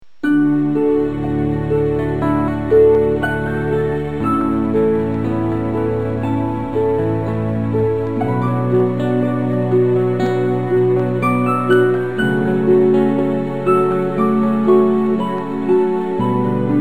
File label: a little classical